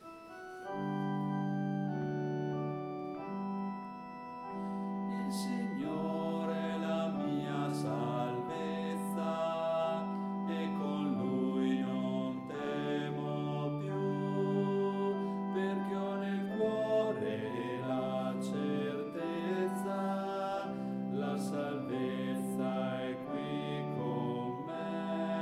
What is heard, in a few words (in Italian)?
bassi